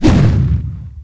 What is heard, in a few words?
freeze explosion; weapons; dota; crystal maiden